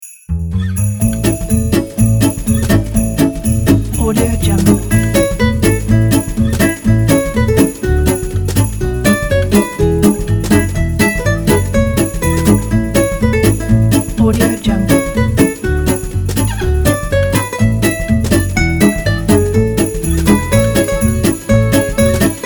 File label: jingle bells swing